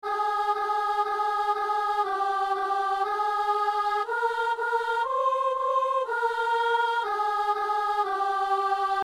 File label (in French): messe de l ermitage, kyrie, soprano